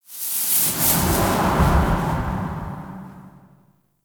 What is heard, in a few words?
Soft Flame Burst, Ambience; Champion Crypt